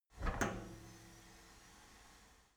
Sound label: cleaning machine open handle